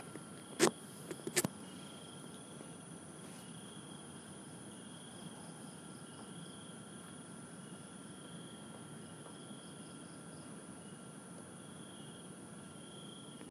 INSECTS

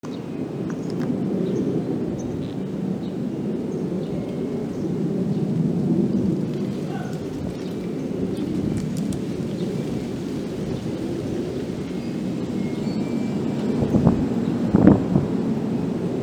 Wind chime

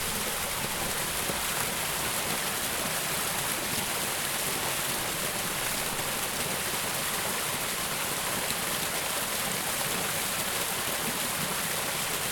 water

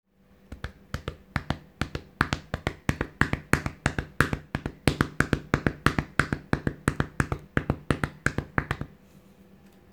Heart Beating

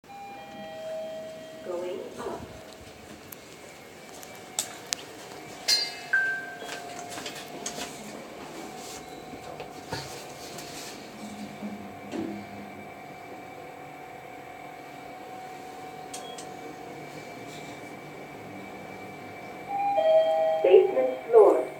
Pleasant sound Lift

